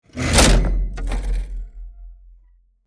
Unstable clock brutal impact

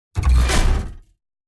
Double bass and wires designed hit

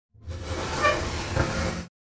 Kitchen oven oven tray slide; cooking

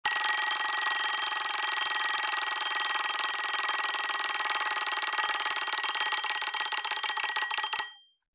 Alarm ring snooze panic; shorts sound effects

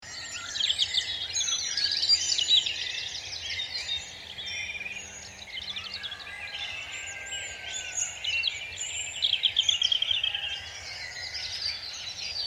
Spring nature ambience birds insects

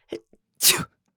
Female sneeze short fast

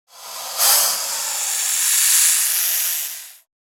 Snake aggressive hiss

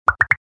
Multiple pop

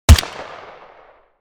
Pump action shotgun fire

